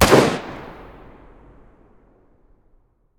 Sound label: sks muzzle mono distant, weapons